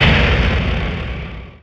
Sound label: Pickup Magic; SFX Kit; Pickups